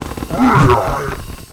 STONE BLOCK WAR ATTACK

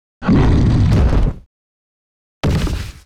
VAFERREX DIE

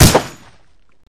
Gun Skin, Damage

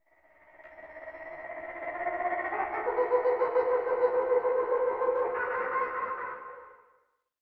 Horror crow, ambience

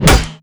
throw metal, Damage

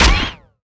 Gun Metal, Damage

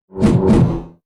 Beam Axe, Weapon

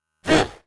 Gunner attack

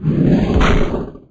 Rust Attack

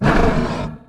Infected beast damage

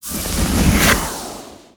Frost Arrow

